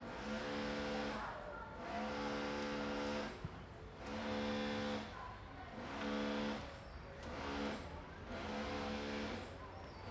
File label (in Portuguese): Ambiencia em Quadra Residencial com Apartamento em Obras e Martelete; Quebrando; ambiencia urbana